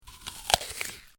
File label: Eating Bite Munch Crunch Person Bites Into Apple; human sound effects